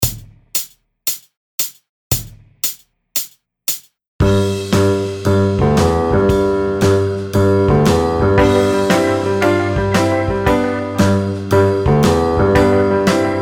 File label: Mixolydian Shuffle; Wagner Int. Book 1